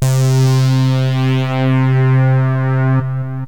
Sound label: syn, loops synth